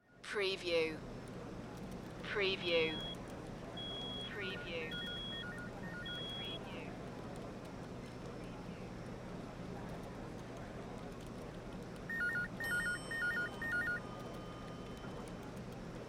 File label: Office Walla Light; sound-effects-bible